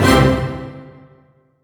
Roland GR-1 Orchestra Hit C5

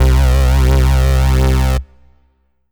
Ensoniq ZR-76 Boogie Bass C2

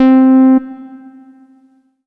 Yamaha V50 SoftLead C4

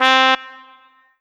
Korg NS5R Trumpet C4

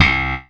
Yamaha TG-55 Slap Bass C4

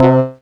Yamaha PSS-170 Dog Pianist C3

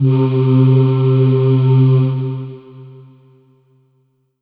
Korg M3R Voice Choir C3

